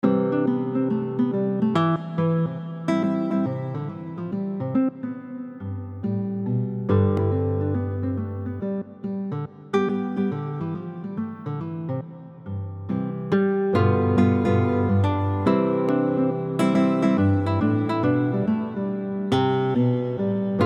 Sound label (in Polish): sylwestrowy blues, guitar